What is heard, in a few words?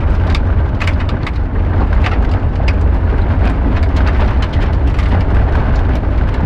vibration on landing camera